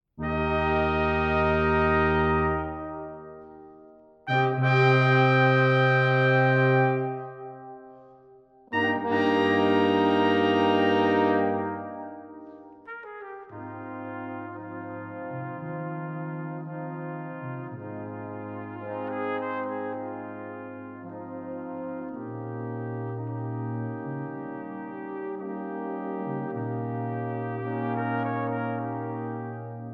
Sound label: Overture; The Magic Flute